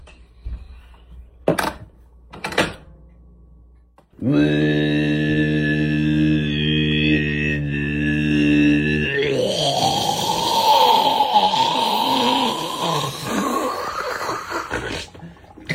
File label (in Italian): macchinetta caffe